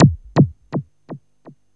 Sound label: ANALOG ECHO; Zero-G Ambient 1